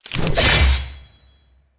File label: archeology event trap spikes; Stellaris; ancient relics